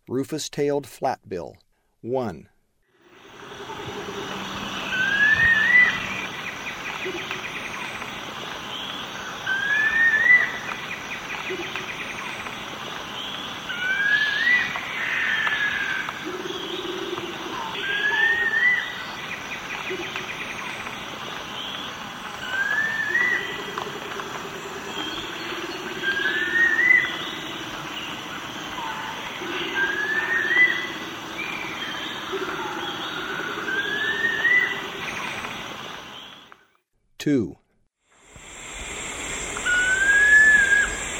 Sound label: Rufous-tailed Flatbill; S. America; Eastern Lowlands